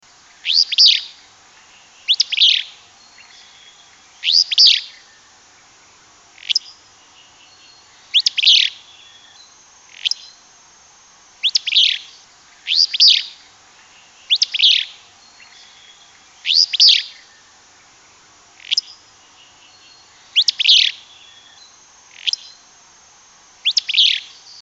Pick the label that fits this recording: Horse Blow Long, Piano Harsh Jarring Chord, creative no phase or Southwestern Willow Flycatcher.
Southwestern Willow Flycatcher